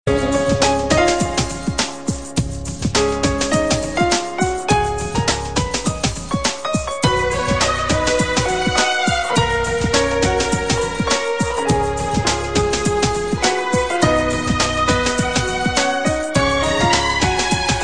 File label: Melody Tone